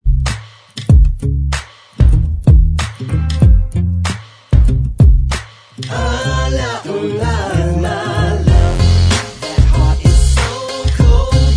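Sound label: backing vocals track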